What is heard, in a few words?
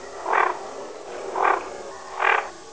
duck